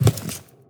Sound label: Footstep Crawler, Monsters; Footsteps, Monsters